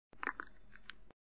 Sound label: Suck; Lewd SFX